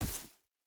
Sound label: footstep rubber boots grass jump land; Rust